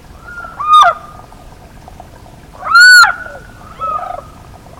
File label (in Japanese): ishikawagaeru